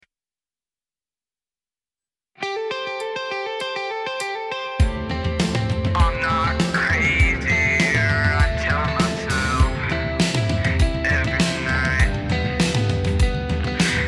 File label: Passing Cars